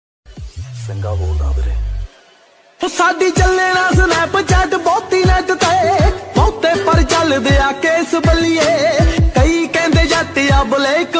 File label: Punjabi Ringtone